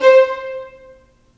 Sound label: STR VN3, Vlns Marc; L-CD702; Vlns Marc1-3